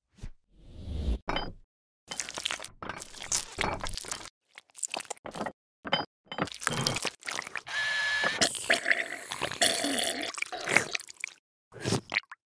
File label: Polish corrector; لاک غلط گیر